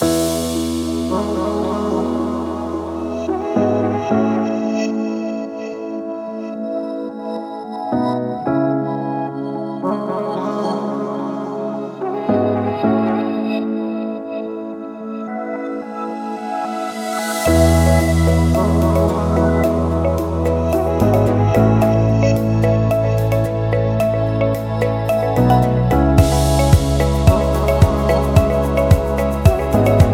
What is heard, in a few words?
music background looping